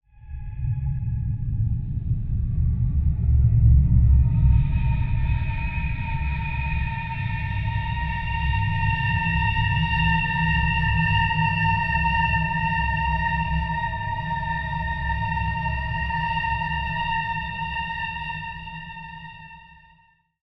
Ambience

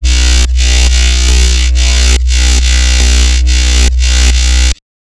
Bassline, Dubstep and Trap

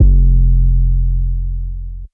808 F; Cymatics; Oracle Sample Pack; 808s

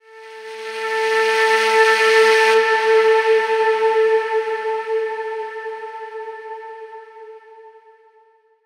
Pipe Riser Am 70Bpm; Construction Kits; STEMS; Ghosthack; SkyRealm; Kit 20 - Sea Of Souls

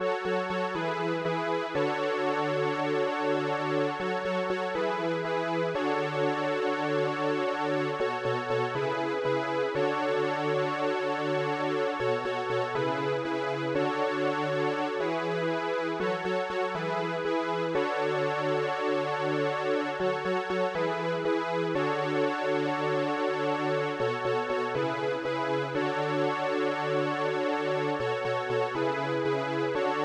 120 BPM F# Min Synth; Melody Loops; Escalade; Retro Collection; Cymatics; ANALOG EVOLUTION Beta

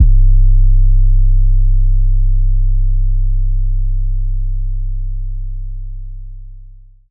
808 C; Advent Calendar - Day 9 - 808s

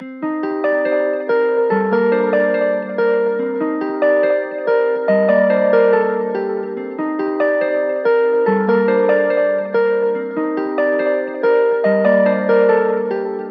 71 BPM G Min Guitar; Trap; Melodies; Loop Stems; Cymatics; Trap Starter Pack; Purple Daze

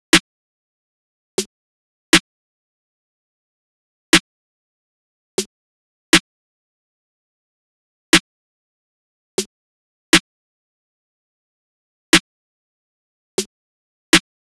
Drum Loop 120 BPM Snare; Cymatics; Vibes II Drum Loop Collection; Bright Drum Loop

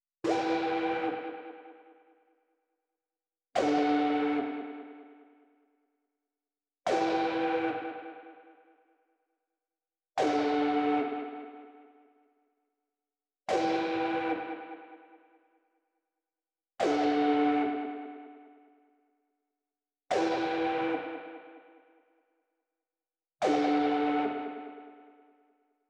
145 BPM E Min Synth; Loop Stems; Cymatics; Utopia

